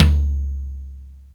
Huge Tom Sound C# Key, Royality free tom drum single hit tuned to the C# note